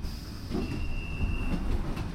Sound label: Door open, Train; OpenBVE